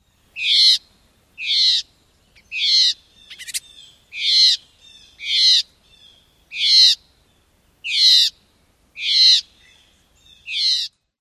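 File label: tchway call, Red-eyed Vireo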